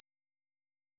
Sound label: sine 21k